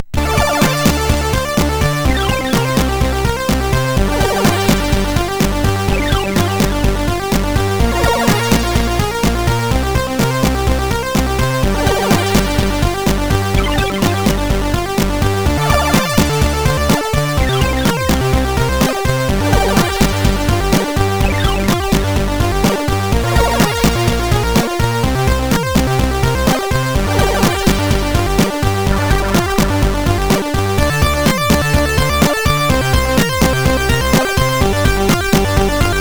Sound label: sid MOS6581R2; Rockmonitor 5